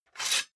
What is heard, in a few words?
sword sabre sheathe slide